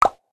generic button; Candy Crush Friends

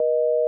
busy; Microsoft Office; LYNC

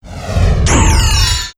Silent stk; Fly For Insanity

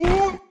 hurt; FlyForInsanity

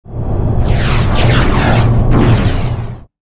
Wind casting; Pc Skill; FlyForInsanity

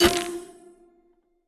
match sequence sfx; Candy Crush Friends

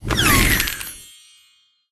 shuffle out; Candy Crush Friends

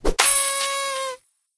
high five; fantastic five; Candy Crush Saga; players club

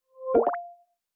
presence; Microsoft Office; LYNC

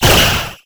skill wand dark scream; FlyForInsanity

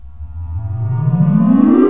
wand amplify; FlyForInsanity

